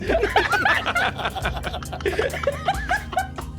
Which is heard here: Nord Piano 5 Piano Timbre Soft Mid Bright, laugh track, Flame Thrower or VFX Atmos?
laugh track